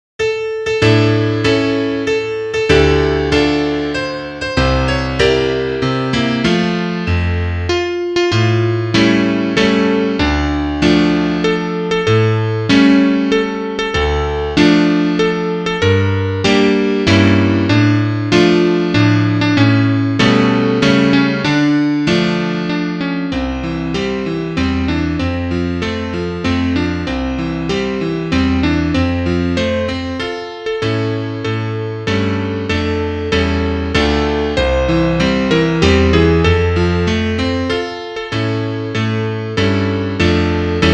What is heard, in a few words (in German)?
Klavier; Liederbuch; und der hans schleicht umher